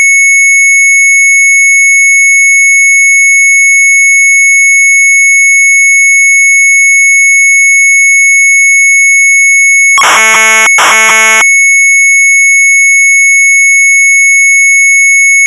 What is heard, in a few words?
Sharp MZ800 Tape; mz800 wav; Easy Soft Index